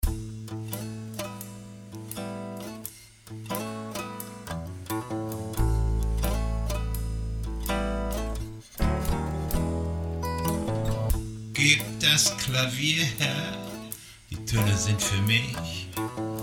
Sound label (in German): klavier